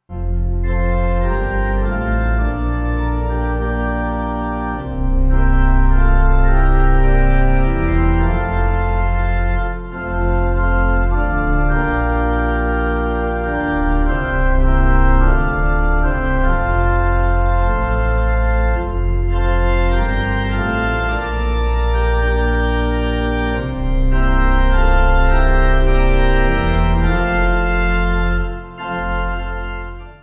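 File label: Pipe, hymn backing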